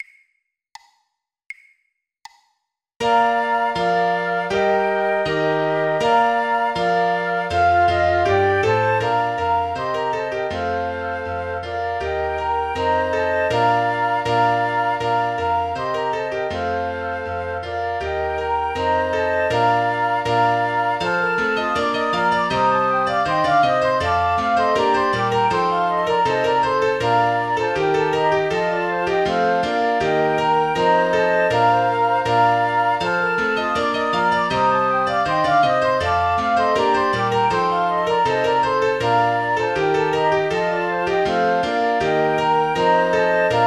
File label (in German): Flöte Klavier; Ding Dong! Merrily on High; 160 bpm; weihnachten